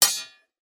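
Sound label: warfare sword hit another fight; zapsplat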